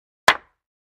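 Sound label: 2x4 Hit Hammer On Cement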